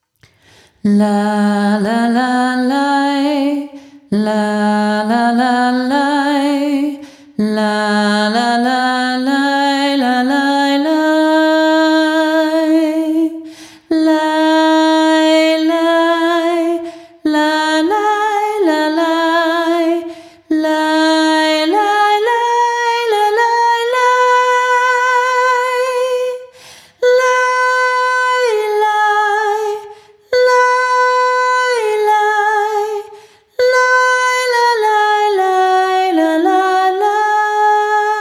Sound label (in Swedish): Vaggvisa Rysk melodi